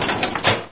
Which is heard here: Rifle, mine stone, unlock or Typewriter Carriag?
unlock